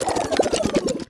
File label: Ghost Resurrection